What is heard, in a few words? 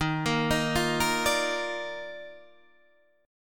D sharp Major 7th Suspended 2nd, Guitar, Standard; arpeggiated; JGuitar